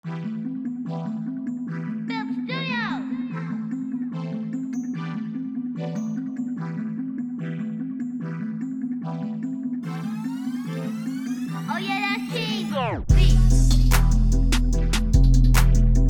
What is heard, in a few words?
dark bells